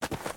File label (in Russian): звук снятия одежды